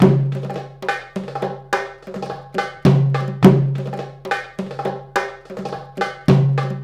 PERC LP, Arabian Traditions

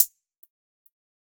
Chh, Drum Hits; Zero-G Trance Inducer; 90 s Sample CDs

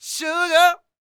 SING, Vocal Planet Blues Soul BLUESY

